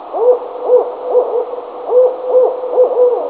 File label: barred owl